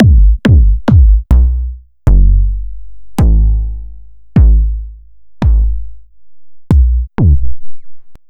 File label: Kicks; Punchbox